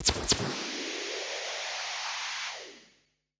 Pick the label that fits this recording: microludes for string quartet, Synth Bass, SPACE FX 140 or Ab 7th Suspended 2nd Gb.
SPACE FX 140